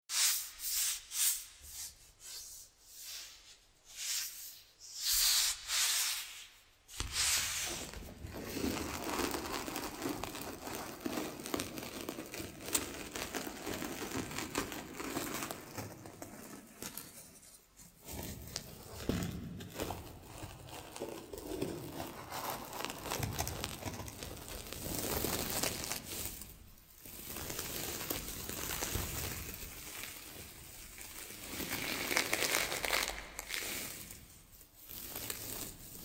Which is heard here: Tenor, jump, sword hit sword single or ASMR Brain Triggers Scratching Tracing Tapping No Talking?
ASMR Brain Triggers Scratching Tracing Tapping No Talking